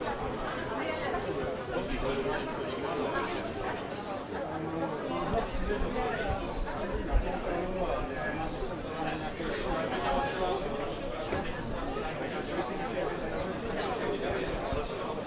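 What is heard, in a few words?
Mob Noise City Central Square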